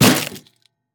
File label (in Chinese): 铁傀儡受损声